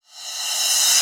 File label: Reverse Cym, Cymbals; Vengeance; Essential Clubsounds Vol.4